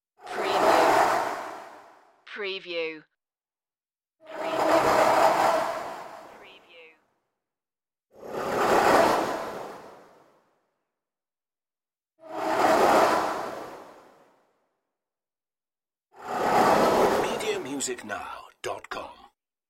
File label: wobbling wormhole whooshes